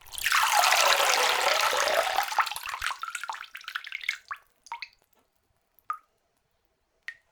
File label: Dribble, Water Dribble